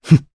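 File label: Vox Landing Japanese; Pavel; kings raid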